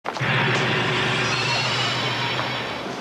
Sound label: evil door opening closing